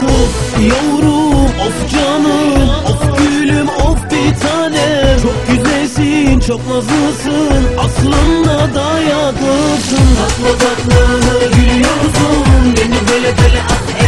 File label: Turkish song